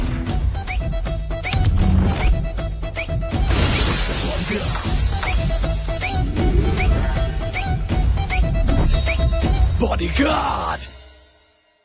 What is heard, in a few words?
Flute Hd